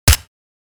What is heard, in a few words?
M4 assault rifle suppressed shot; war